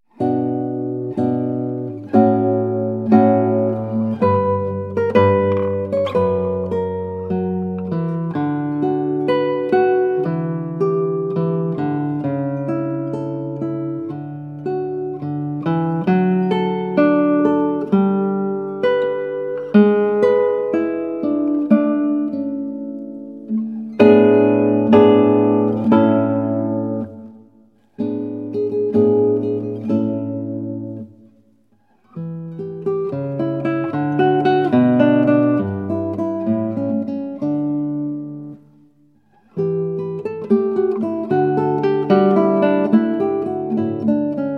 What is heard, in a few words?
Partita No. 7 in C Major, for Guitar, Andante